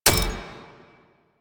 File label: Sticky Bomb Proj Land; Grineer; Veilbreaker; Vor